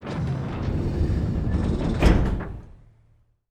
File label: Aircrafts Mi-8MTV2 Cargo Door Close; DCS